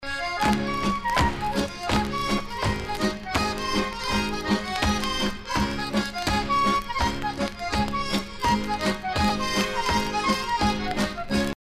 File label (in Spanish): tono tradicional